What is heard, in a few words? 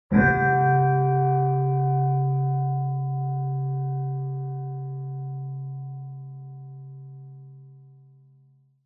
Buddhist Temple Bell